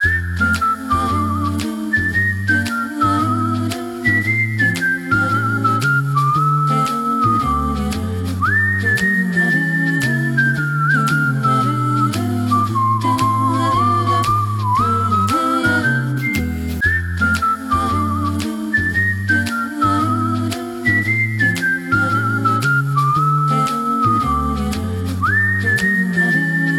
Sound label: Whistling Wizard, ringtones; LG isai LGL22